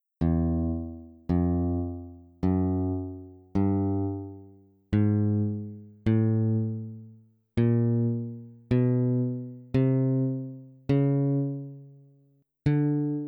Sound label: string6, MUSIQUE notes